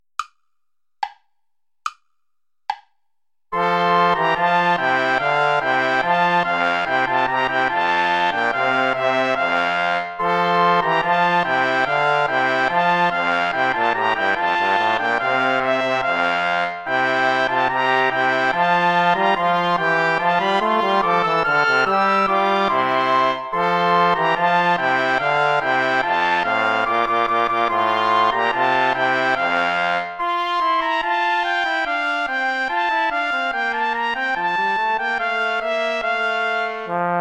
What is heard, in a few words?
play along without trombone; traditional